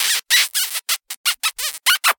comedy kiss; sound effects one

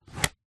leisure toy plastic slider slide

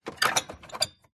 cash register till drawer note clamp lever lift; zapsplat

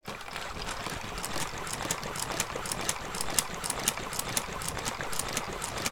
toy robot shuffle walk; sound effects one

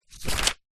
notepad page turn thin paper a4; foley

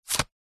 money credit card remove from wallet; foley; zapsplat

